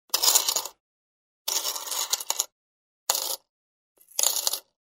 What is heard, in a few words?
Removing coins from the cash register, money